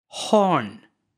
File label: horn